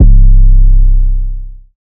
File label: 808 Standard (C); Cymatics; Orchid Sample Pack; 808s & Basses